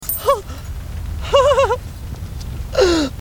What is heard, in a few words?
Laughing; Superbad